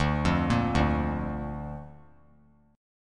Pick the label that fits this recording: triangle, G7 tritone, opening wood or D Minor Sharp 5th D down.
D Minor Sharp 5th D down